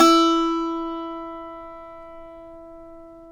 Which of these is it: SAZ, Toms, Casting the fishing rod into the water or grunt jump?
SAZ